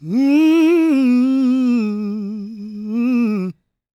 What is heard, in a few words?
GOSP MALE, SOLO; Gospel